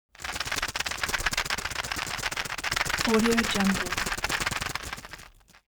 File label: foley plastic drug blister shake hard